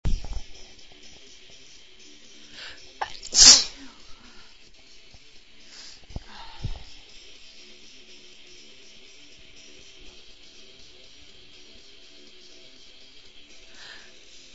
false starts, female

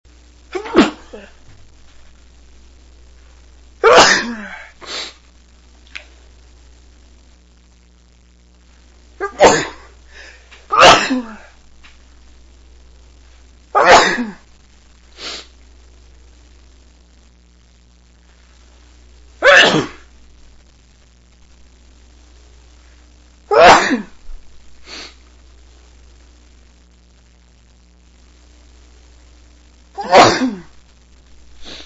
cant stifle, male

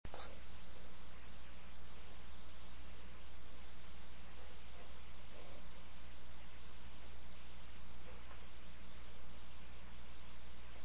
stifles, male, sneezes

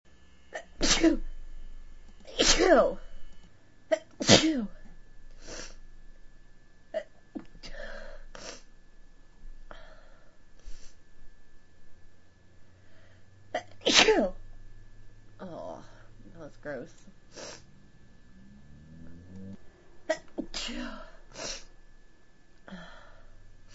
dusty desk, female

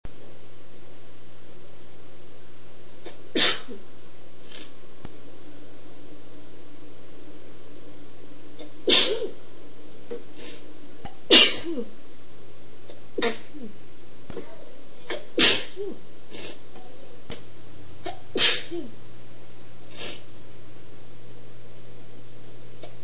caught your cold, female

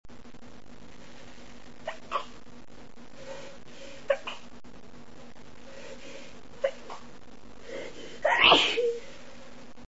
stifle, female

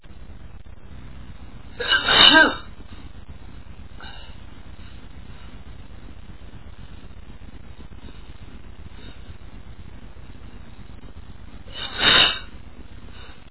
headcold, male, achoo

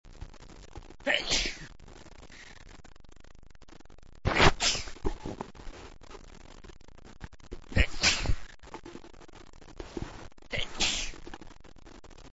wet sneezes, male

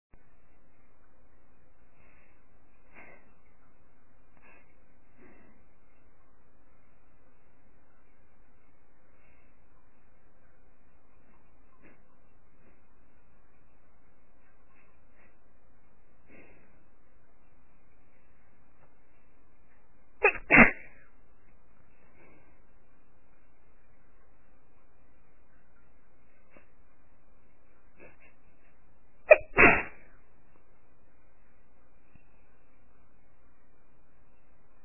wettish, female

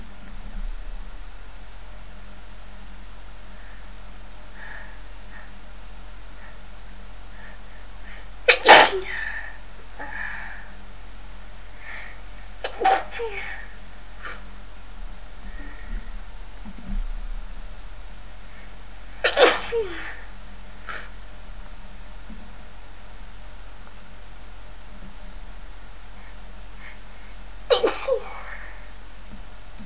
caught cold, female

